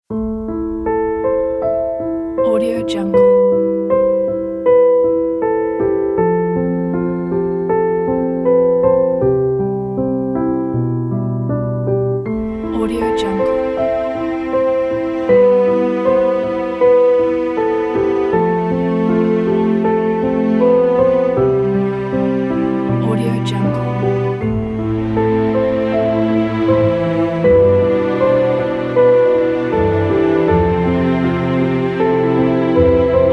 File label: inspirational story day